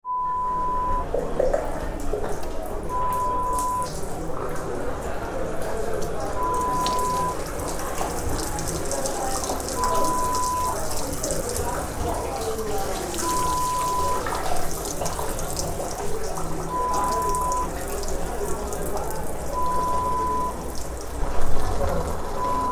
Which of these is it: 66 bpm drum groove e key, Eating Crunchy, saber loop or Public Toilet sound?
Public Toilet sound